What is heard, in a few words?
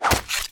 monster hurt; Original TK Sounds